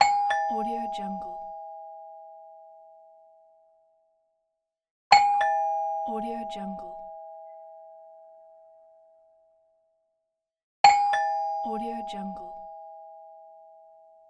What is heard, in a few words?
ding dong